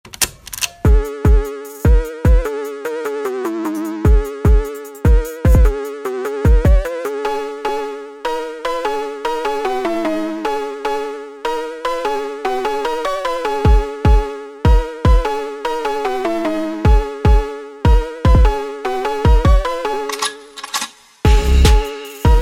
Phonk Drift Edition

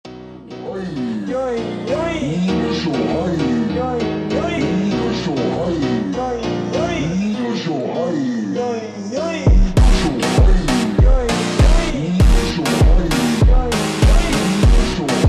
ultra Slowed Reverb

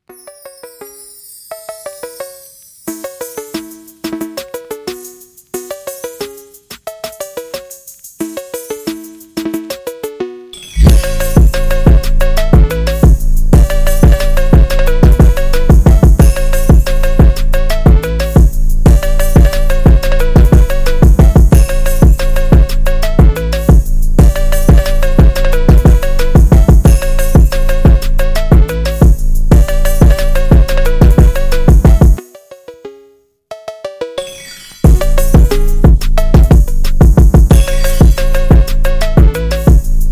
Phonk Drift New Year feat Phonk, Drift Phonk

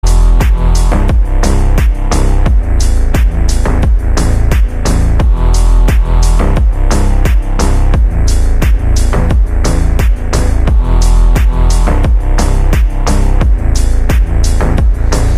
Slowed; ringtone

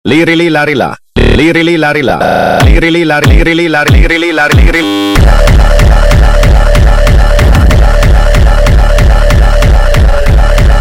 Hardstyle

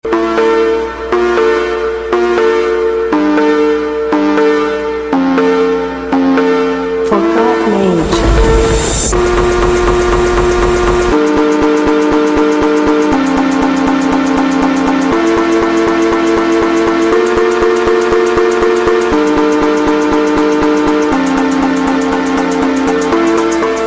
Phonk; ringtone

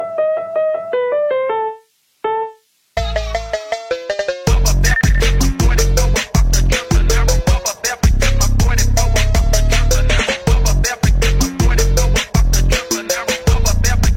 Phonk Remix; Fur Elise; ringtone

